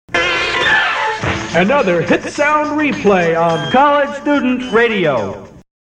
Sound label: Hit Sound